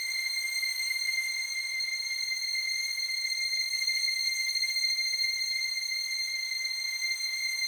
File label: Mist, strings